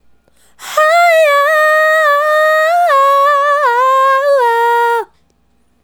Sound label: Female Solo, Vocal